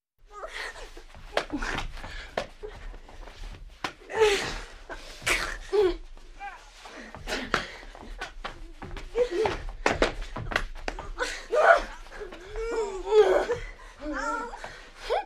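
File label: sounds of people fighting and fist punches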